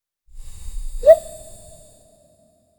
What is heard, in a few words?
woosh intro, whistle